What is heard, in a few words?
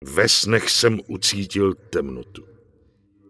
Night Elf Male Cheer; wowpreklad; czwow; NightElfVocalMale